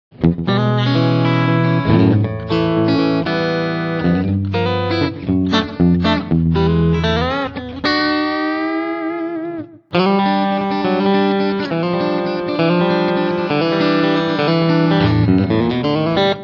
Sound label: Dualtone Cut Country, guitar